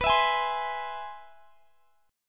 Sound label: C Augmented Major 7th B